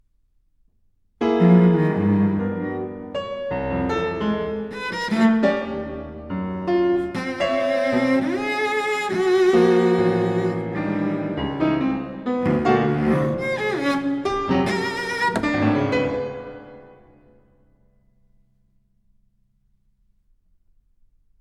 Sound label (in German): violoncello u klavier, sehr bewegt